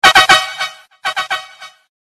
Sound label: DJ Effect - Trumpet Horn